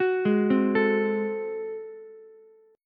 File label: Ab 7th Flat 9th Ukulele C6, chord; Ab7b9 arpeggiated; JGuitar